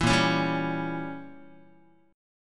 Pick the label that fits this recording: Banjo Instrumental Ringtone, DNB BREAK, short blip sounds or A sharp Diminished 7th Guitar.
A sharp Diminished 7th Guitar